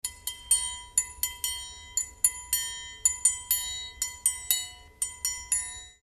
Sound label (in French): triangle